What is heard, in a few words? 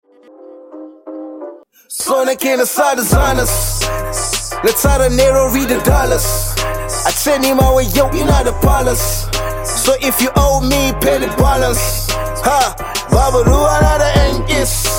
Instrumental